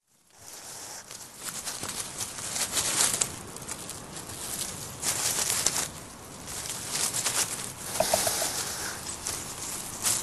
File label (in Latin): erinaceus europaeus